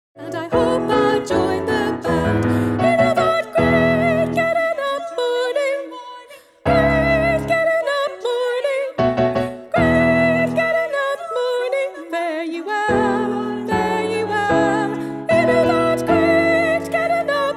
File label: Soprano